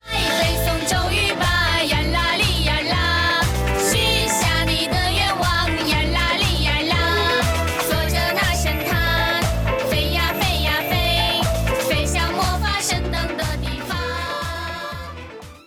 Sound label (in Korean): 중국여 동요